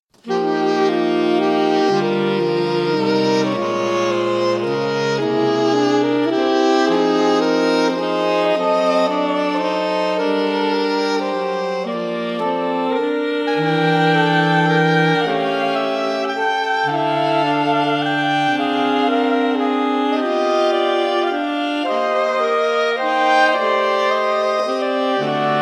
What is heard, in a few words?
silent night variation for sax, for saxophone quartet